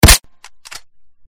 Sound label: Sniper